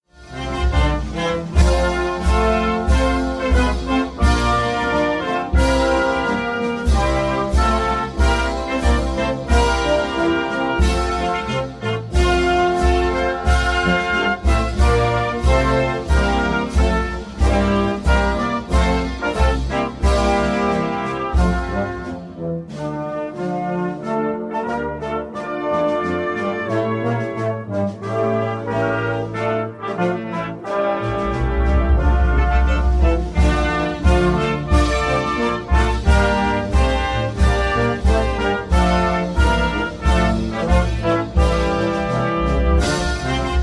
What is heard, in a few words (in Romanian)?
Imnul Republicii Moldova Instrumental; 5 strofe